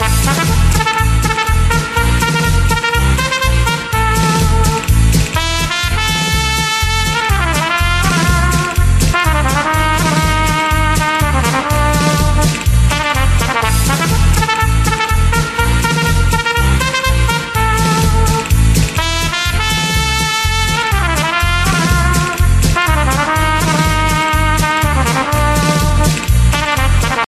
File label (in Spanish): Pasodoble Clasico